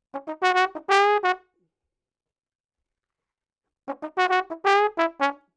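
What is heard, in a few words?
Latin Jazz Trombone, F#Maj, 128 bpm; Brass; Pro Tools Essential Loops; Digidesign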